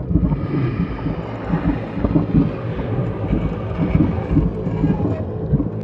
swarm big station idle; Stellaris; other entities

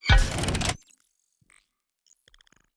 ui relic; keides; Stellaris; paragon

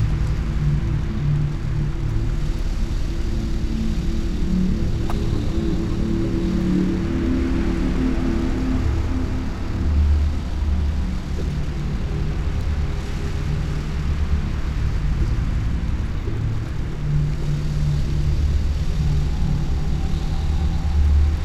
ship juggernaut idle; Stellaris; toxoids; ships

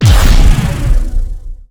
tachyon lance fire; weapons; Stellaris

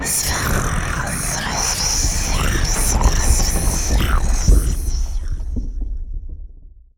lithoid portrait snake; lithoids; Stellaris

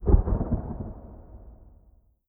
dimensional horror portal thunder; Stellaris; guardians

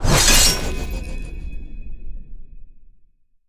sfx relic activation; blade of the huntress; Stellaris; ancient relics

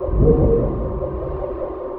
progress; Stellaris

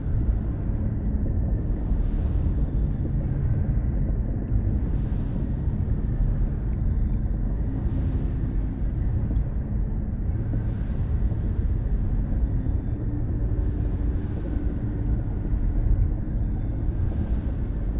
juggernaut idle; aquatics

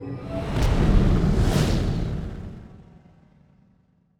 ship laser move; ships; pre-FTL; Stellaris; first contact

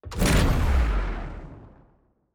gravity snare success; Stellaris; grand archive; ships

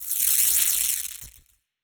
lightning hit electrical sparks, weapons; kaiju; Stellaris; biogenesis

